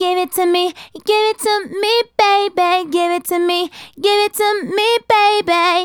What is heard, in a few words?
Female Solo, Vocal